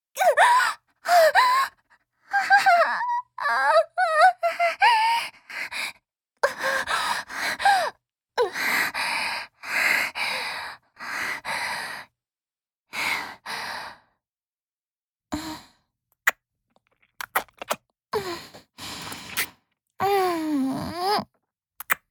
絶頂後の息遣い→キス

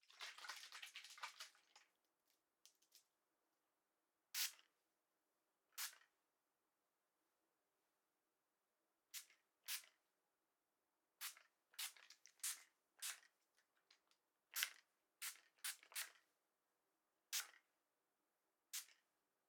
化粧水をかける

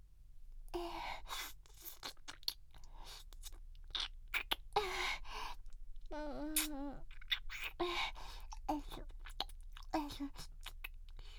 乳首をなめる

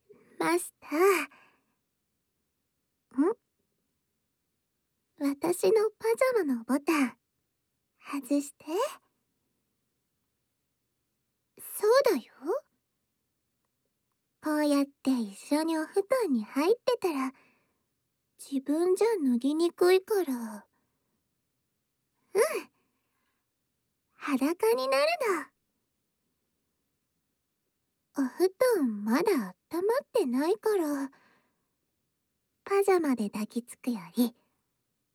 お耳をぺろぺろしながら; おててやおしっぽで優しくしこしこ

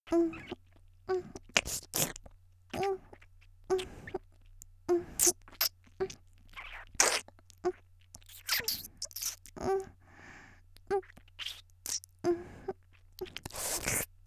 キス ディープキス; 綾波系; 少女

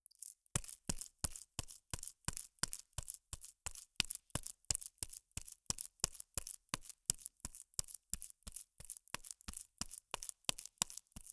ぱんぱん

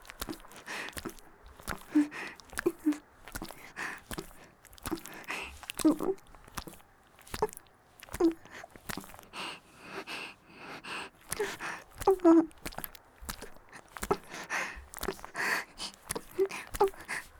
木枯らしバージョン ギシアンループ; あ～にき！いくら出す?

